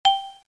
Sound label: ding